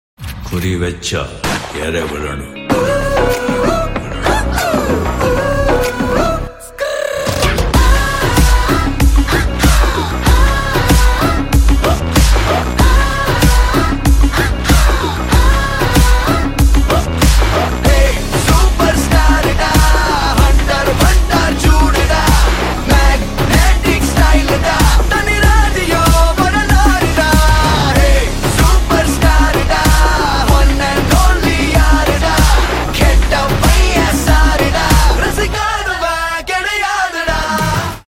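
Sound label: background music ringtone; Vettaiyan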